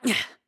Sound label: Throw; BOTW; Breath of the Wild; Link